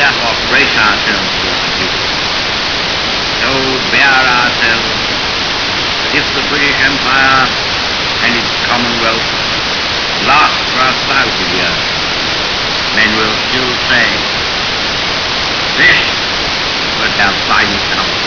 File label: chamber noise